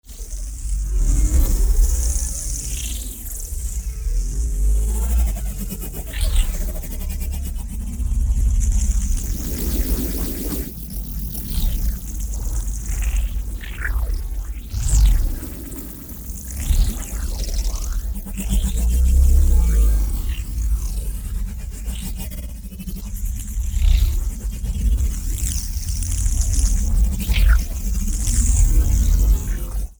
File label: MOVEMENT X Ray; NEON; boom library